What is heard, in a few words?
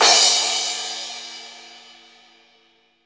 CRASH, CYMBAL; drum